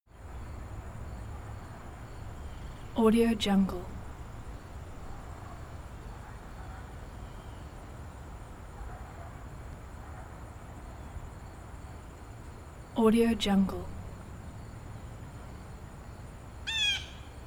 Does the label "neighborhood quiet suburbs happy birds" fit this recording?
Yes